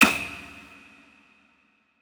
finger snap

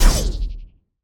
close combat hit hard; CrossCode; battle

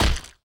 hit organic deep; CrossCode; battle

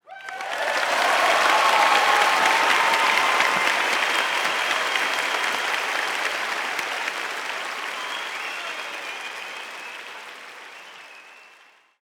applause; CrossCode; arena

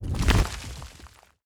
turtoise appear; drilltoise; battle

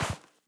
snow land, move

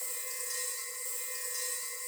ferro switch loop

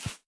snow step

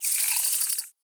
spider web shot; battle

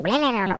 mumble; World Of Goo

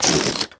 deflate, balloon; World Of Goo

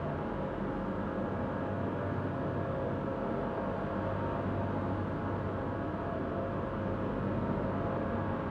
cargo ambient; CrossCode

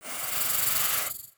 pillar snake; battle

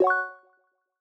menu submit

